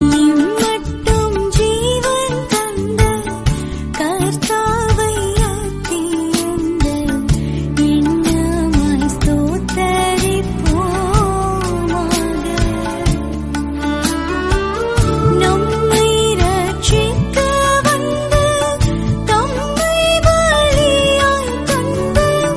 christian tamil song

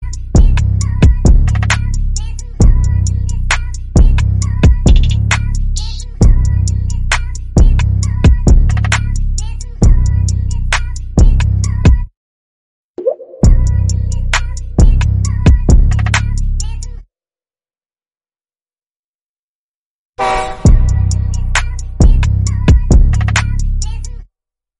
instrumental

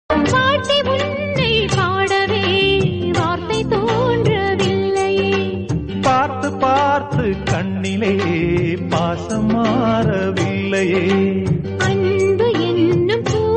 tamil love song

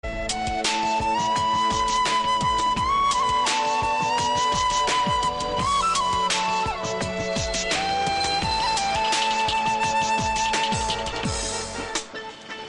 flute music

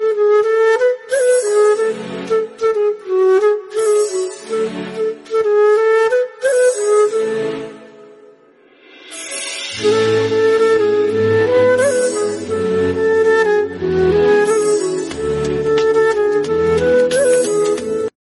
bgm

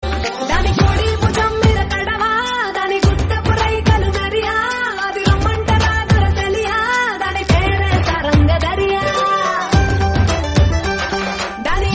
telugu song

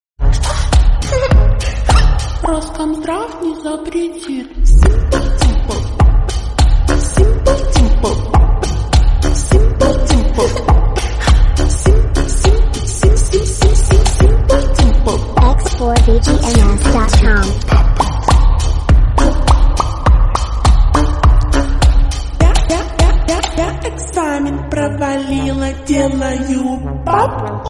remix english song

